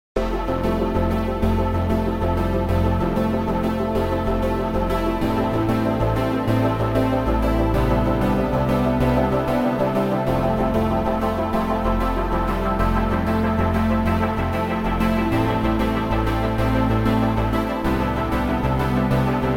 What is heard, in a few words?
Future Analog Synths; That Worship Sound®; omnisphere 2